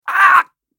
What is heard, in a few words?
"Ahh", samurai voice; samurai voice selection 2